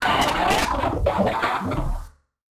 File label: Casio, Glitched, malfunctioning synth